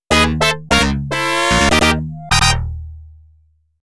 DRAG RACE START; Taito Digital Sound Archives Arcade Vol.2

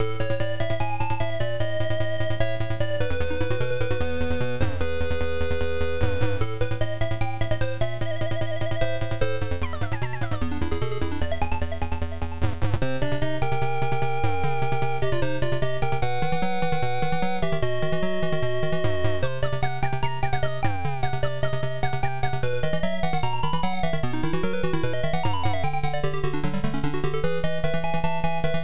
Ruins (Neutral); Alchi; treasure of the usas; msx

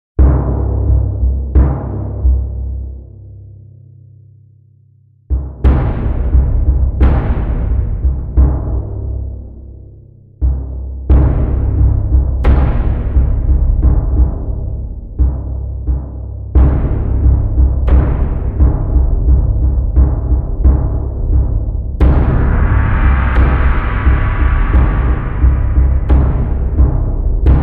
Tension Drums; Real Warfare 1242

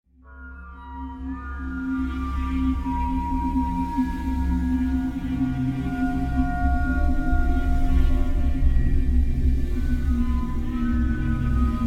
music ambient strange; gemini wars; windows gamerip 2012

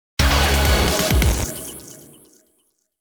Finish Jingle Theme; deca sports freedom; xbox 360

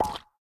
Brain Bounce

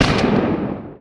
Shotgun Fire Single stereo; SFX FIREARM; Shotgun Model 04; The Looker

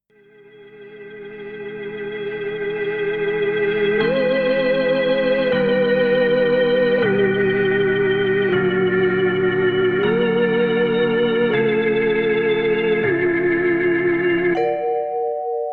Theremin

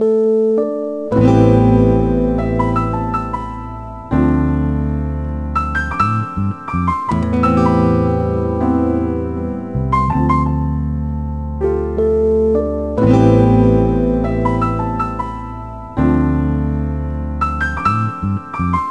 Sad Song; majin tantei nougami neuro neuro to yako no bishoku zanmai; nintendo ds

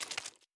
Footsteps Bug Swamp; We Are The Dwarves

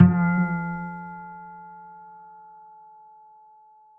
Plonk Vine Soft

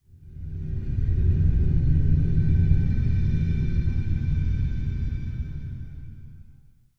Warning; Inception The App

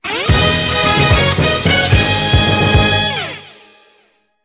win jingle; Slotter Mania P Tetsuya Shinjuku vs Ueno; PSP gamerip 2010

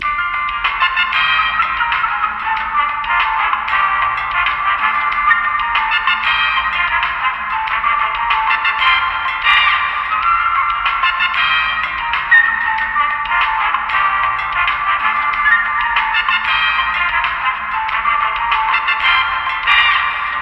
Elevator Music; Shadow Warrior 2 gamerip 2016

